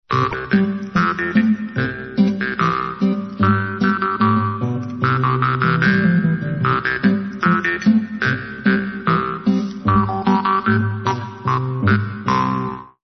Maultrommel; Gehrenbergspatzen

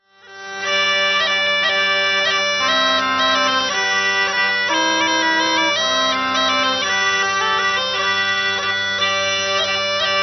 Tanz um 1500

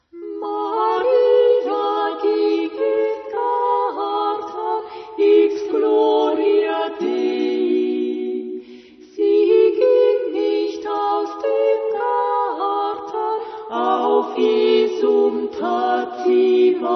Maria ging in Garten, Viergesang; trad; Bregenzer Viergesang

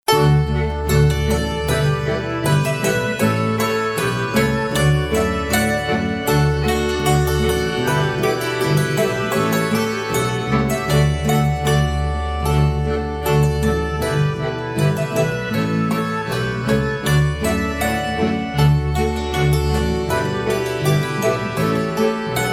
Hackbrett Polka

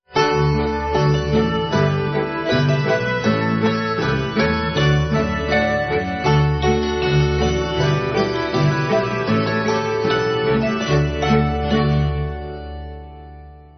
Polka Hackbrett

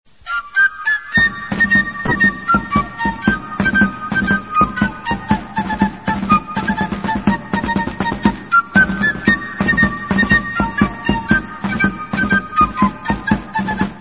Hochzeitsmarsch Schwegel Tromm; Ausseer

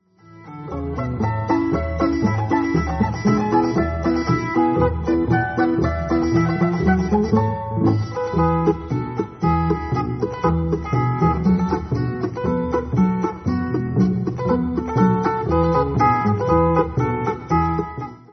zither; Scherrzither; Wurzacher

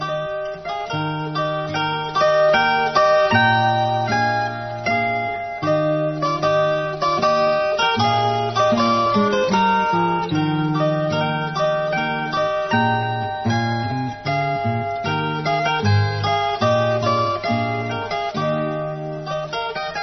Menuett zither gitarre; Eglofstaler

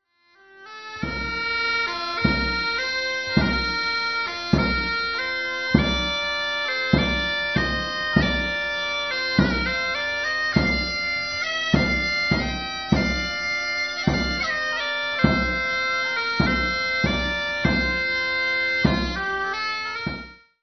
Dudelsack, Schaefermarsch; Markgroeninger

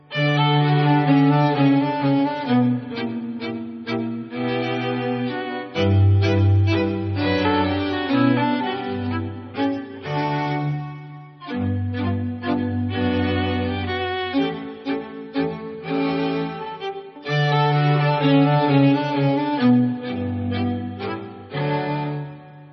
Streichq Allemande

